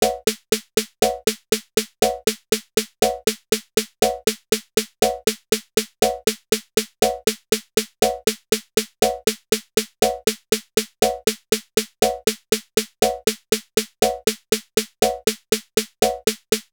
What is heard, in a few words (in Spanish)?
semi corchea 60bpm